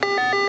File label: keypad ack